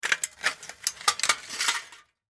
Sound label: Snips Cut Thin Metal Sheet Cutter; Scissors; INDUSTRY; Blastwave